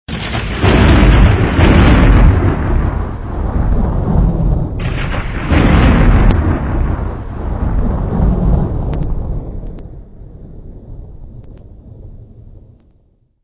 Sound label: Thunder Crash